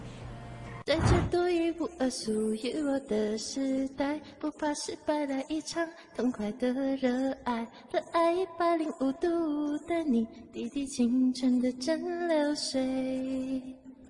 singing

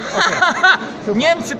hahaha

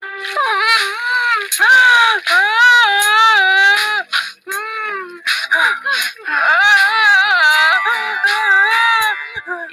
crying

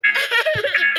cute giggle

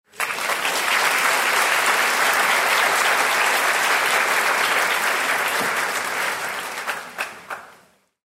audience clapping

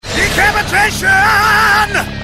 decapitation

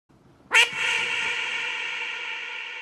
duck quack echo

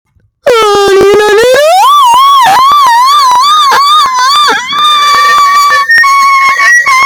siren man

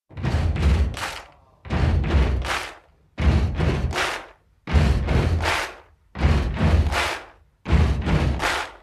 drums

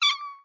notification sound; my talking tom

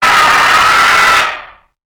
scream

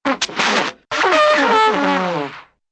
bugle sputtering

